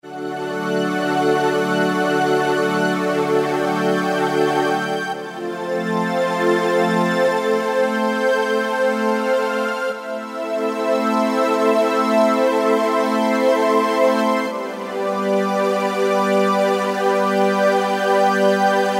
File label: Korg microKORG Old Strings; Factory Voices